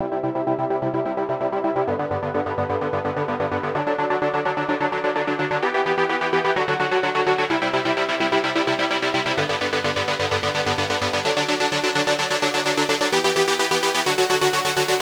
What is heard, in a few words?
128 G Buildups Chords; electro pop melodies; Pulse